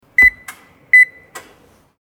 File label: electronic key